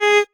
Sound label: marimba; ゆめ2っき